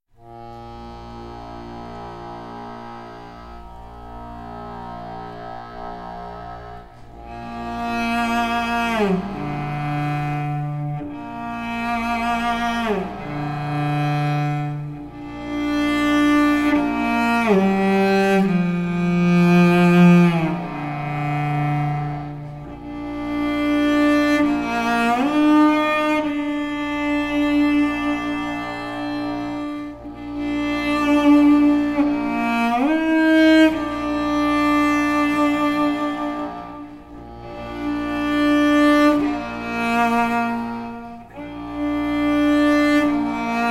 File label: Cello